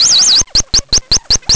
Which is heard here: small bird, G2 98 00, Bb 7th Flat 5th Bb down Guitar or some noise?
small bird